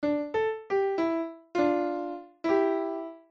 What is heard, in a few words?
vmpk riff